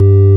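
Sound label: ORG Vox G, Continental organ; Keyboards of The 60's and 70's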